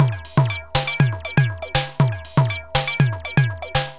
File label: Elektro, Processed Percussion